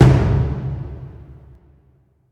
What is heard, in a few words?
fire; smite; god raijin